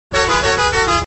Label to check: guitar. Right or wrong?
Wrong